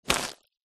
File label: present gift wrapped pick up grab